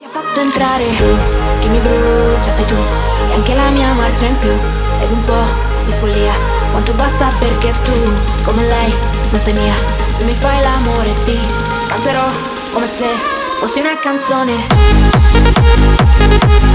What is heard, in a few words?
Tu Techno Mix Snippet